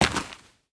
dirt footstep

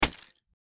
glass, bullet hit; metro

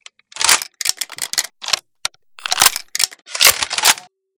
mc116 load; weapon

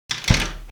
wooden Crate Close

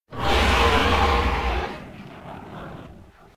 shrimp Death

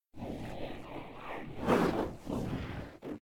shrimp Alive; customnpcs

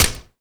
pm silencer, weapon, makarov

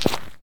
mud footstep

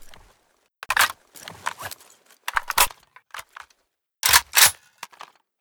ak load; rpk; weapon

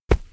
kikimora Walk

